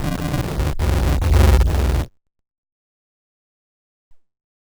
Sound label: Rando Syn, malfunctioning synth samples, Random Synth Failure